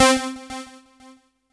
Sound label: C3, synth line; 90 s Sample CDs; NiceBeats; SynthLine VOL-3